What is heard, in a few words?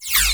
Klingon Disruptor Beam